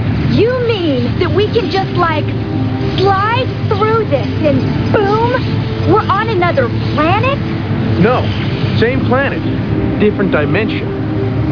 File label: boom; sliders